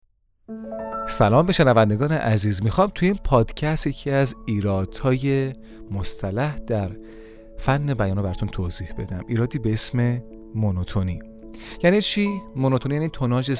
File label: PAD